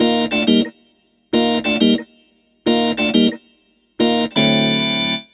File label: Organ Lick